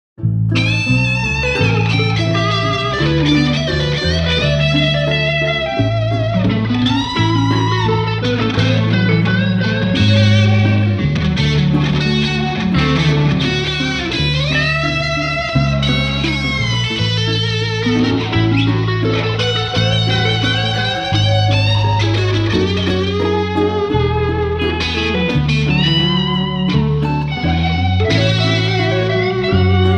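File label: Solo Guitar 1+2 + Bass + Dobro 1+2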